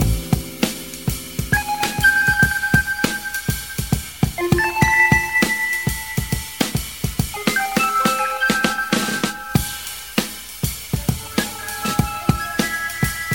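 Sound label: Hammond rif, Left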